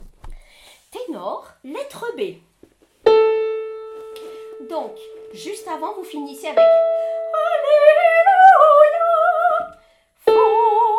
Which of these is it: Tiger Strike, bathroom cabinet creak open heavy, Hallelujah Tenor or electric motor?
Hallelujah Tenor